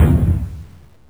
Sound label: gun; madcars2; radicalplay